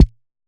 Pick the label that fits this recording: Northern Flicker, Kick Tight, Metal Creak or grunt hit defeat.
Kick Tight